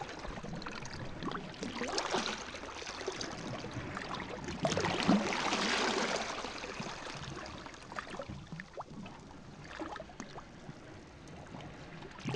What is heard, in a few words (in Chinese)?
汩汩声; 海浪聲; 自然音療